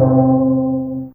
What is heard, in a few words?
Stl Drum C3, Fairlight CMI IIe, Mallets